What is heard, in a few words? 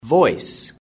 voice